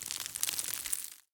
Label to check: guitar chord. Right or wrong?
Wrong